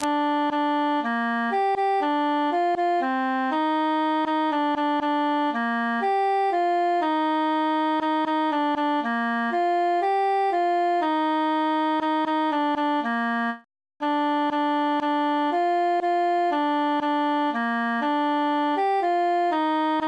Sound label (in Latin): altus solo; la la la